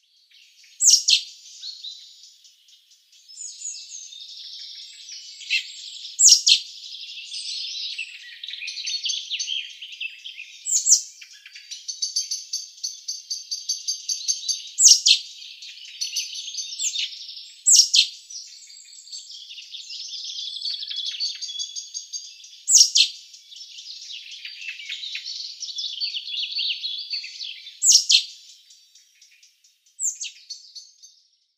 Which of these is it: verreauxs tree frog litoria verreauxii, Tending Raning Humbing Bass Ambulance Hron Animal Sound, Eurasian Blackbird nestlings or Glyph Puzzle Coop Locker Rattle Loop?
Eurasian Blackbird nestlings